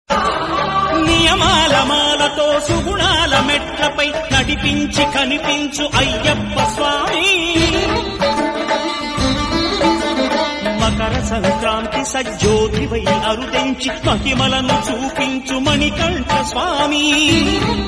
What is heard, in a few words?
Ayyappa Swamy Ringtones telugu